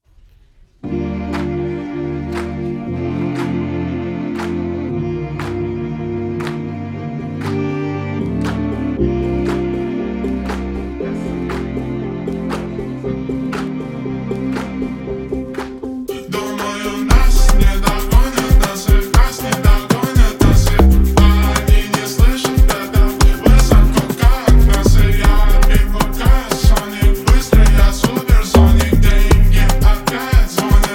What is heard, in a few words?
amapiano remix